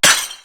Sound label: clink; blackquill